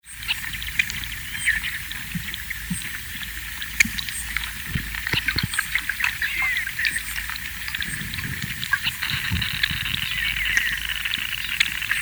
fish sounds guester channel northerngermany, underwater